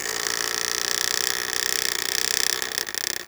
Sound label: door